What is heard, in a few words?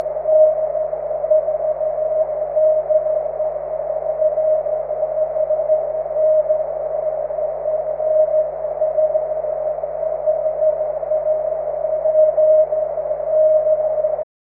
cw; 23cm